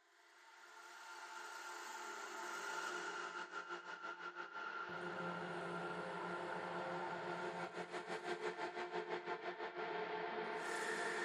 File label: Noises